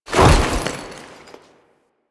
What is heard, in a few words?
Foley Melee Sweep; Warframe; Kahl